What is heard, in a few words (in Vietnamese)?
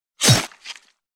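âm thanh tiếng gươm đâm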